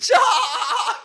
tchahahaha

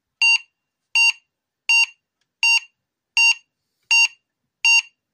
school bell 7 pulses

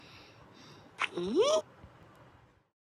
hm; pou

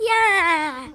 jaahaaha

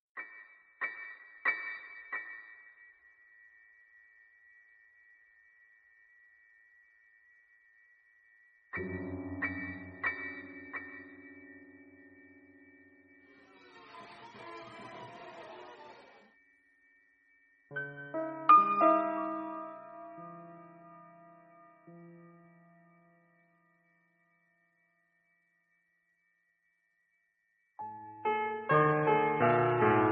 ambience; granny

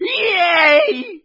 yayyyy

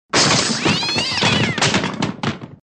cat crash

